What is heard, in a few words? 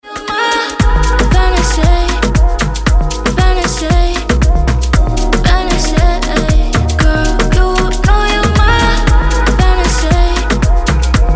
POP LATIN